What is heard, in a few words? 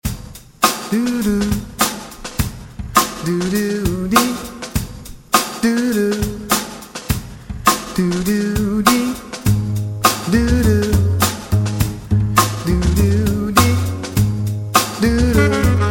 Drama Blues